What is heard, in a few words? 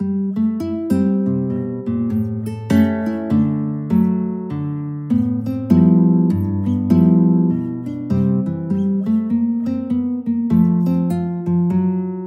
guitar